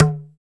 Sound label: TEK SNR; TEK PERC1; Drums In Your FACE!